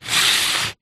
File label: sport plastic sports cone short scrape on concrete; zapsplat